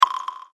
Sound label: multimedia rollover, Multimedia Rollover Tone